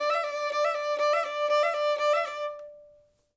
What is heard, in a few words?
violin